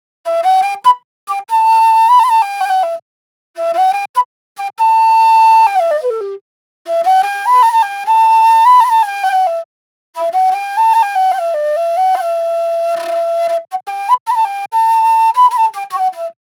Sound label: Drill Flute Loop BPM 145